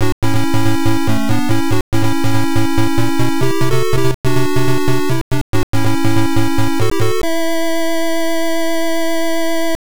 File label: Area Clear; Aleste Gaiden; MSX2; PSG